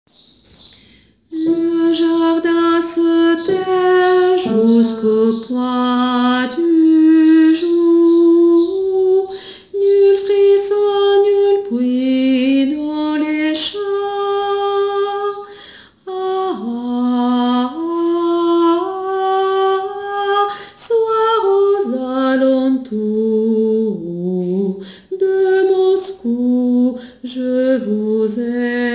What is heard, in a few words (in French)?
Basses